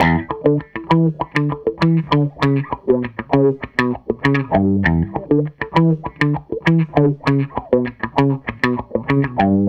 SG Guitar Loop; Sonic Foundry (Sony Creative Software); Six-String Orchestra

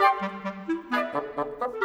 Winds, Orchestral; Sonic Foundry (Sony Creative Software); Orchestral VOL-2; Modern 23

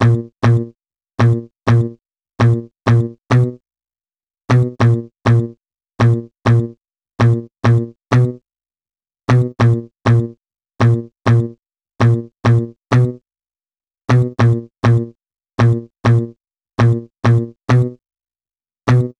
Guitar, Hip Hop; Sonic Foundry (Sony Creative Software); Lukecage Underground Soundlab Hip-Hop

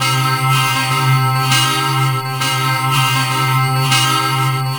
Mechanised Guitar, Strings; Sonic Foundry (Sony Creative Software); Myths of Technology

